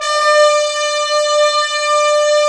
BRS BNSF31R, Roland LA Composer, Tbns; 90 s Sample CDs

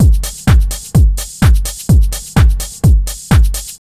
BEAT, Disco House; USB Soundscan vol.20; AKAI

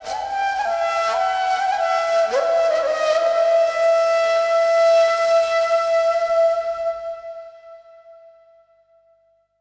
SIERRA FLU; Best Service Advanced Media Trax Building Blocks

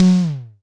FX SYN, Analog PER; Sound & Vision - Gigapack II

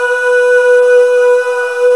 Male Ahz; 90s Sample CDs; Club-50 - Foundations Roland

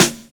DISCO SD, DISCOKIT; USB Soundscan vol.11 - Drums Dance & Groove; AKAI

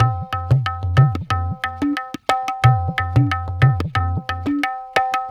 Tabla

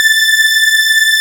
PAD WARM, Nord; Wizoo - Powered Nord; LEAD PAD HRN

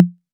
808 SD Orig, Snare Drum; Goldbaby Tape808 & Tape909

